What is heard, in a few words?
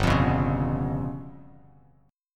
G 7th Flat 5th down Bass Standard; strummed; JGuitar